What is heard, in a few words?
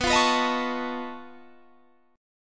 B 9th Flat 5th B down Guitar Standard; B9b5 strummed; JGuitar